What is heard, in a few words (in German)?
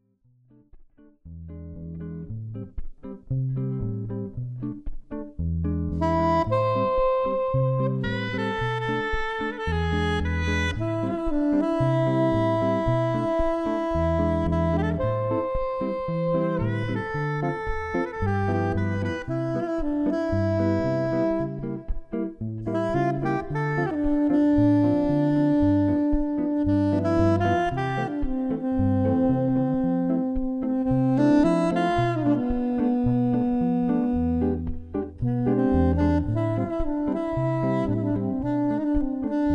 Sax Git